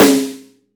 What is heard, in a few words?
large room snare drum sound c key